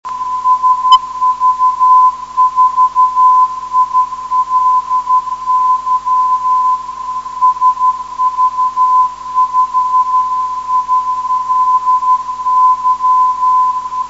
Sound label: 10139.5khz; sv4ily; hf beacon